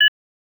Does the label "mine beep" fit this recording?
Yes